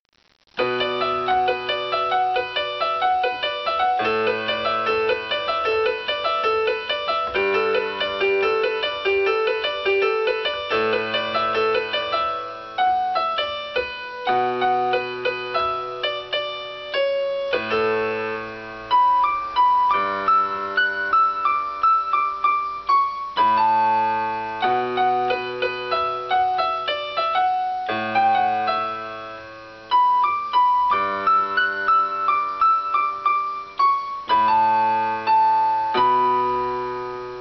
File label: piano cover